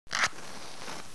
match

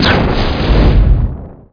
fuel drum; Spec Ops 2

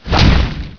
staff hit; Heretic II Demo

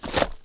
step jungle; SpecOps2 MPlayer Demo

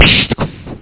Car

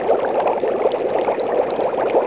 fast bubbles; objects; Heretic II Demo

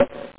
BLOCK; CU Amiga Super CD-ROM 14

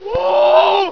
berp; valhalla

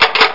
reload; CyberBlast

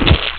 slak; GoldPusher

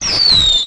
attack

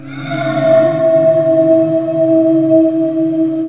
sfx voodoo

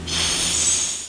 psssii; Mobiclic46

